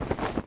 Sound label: Cloth Move; Civ4 warlords